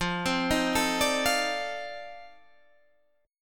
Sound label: F 13th F, chord